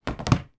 foley storage box plastic small down on top of other